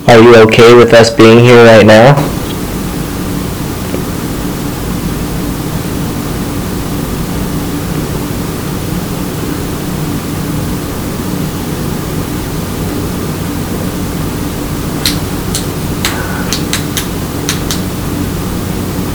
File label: clinking and yes